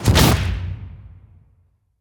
Melee Impact Base; Grounder; Evolve; Kraken

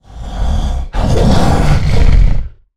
Pain Light Breaths; Evolve; Goliath

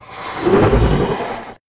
Grounder Sniff, Kraken; Evolve

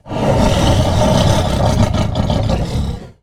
Round End Victory; Evolve; Goliath

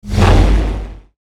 Rock Throw FLAME; Evolve; Meteor Goliath

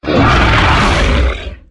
Exertion Heavy; Evolve; Behemoth

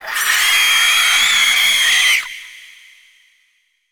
Spider Trap Emerge; Evolve; Gorgon

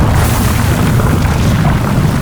Seismic Blast Staircase Erruption Loop; Evolve; Behemoth

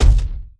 Climb Base; Evolve; Goliath

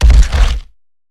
Climb Base; Evolve; Behemoth

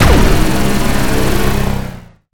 Laser Arm Shoot; Ares; Terraria

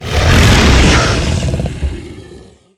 Sniff; Evolve; Behemoth

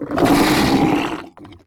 Grounder Jump land, Kraken; Evolve

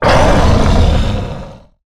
Leap Attack; Evolve; Goliath

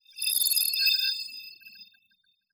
Warp Blast AIM Start; Evolve; Wraith

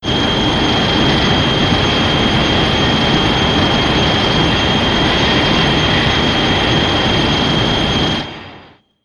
Heat Ray; Shin Godzilla

